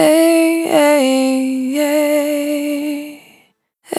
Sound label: Single Voice Melodic Loops, Vocal, Dry